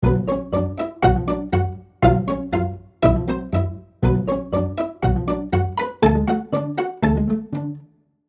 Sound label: Strings2 Pizz; Hall of the Mountain King; Spitfire Labs